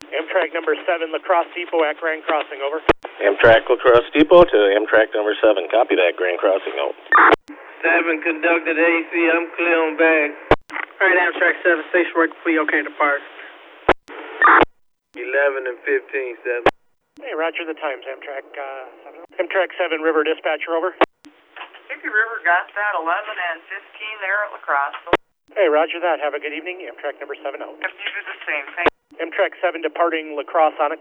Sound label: Amtrak